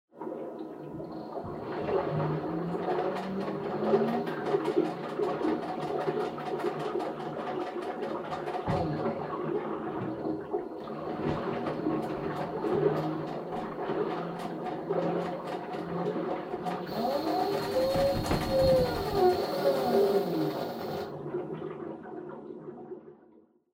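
Washer Front Load Operation Noise Weight Detection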